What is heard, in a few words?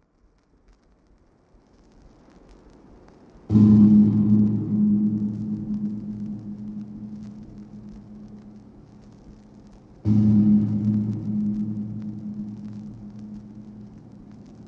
Inside Great Pyramid Tuning to Coffer